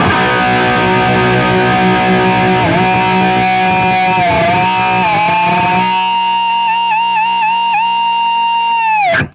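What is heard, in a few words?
PWR B CUT, guitar, power chord cut; East West; Steve Stevens Guitar Samples Collection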